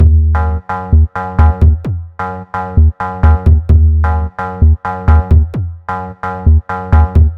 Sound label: BEAT FLUXO SIMPES